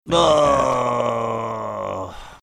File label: groaning